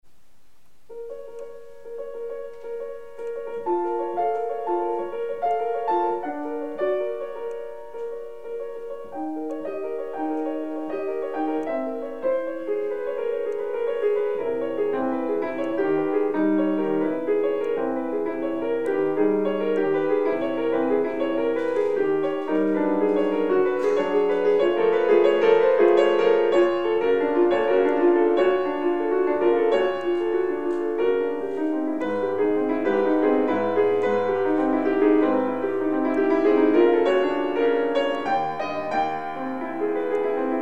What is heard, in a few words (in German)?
Inventionen zu BACH fuer Klavier, Terzen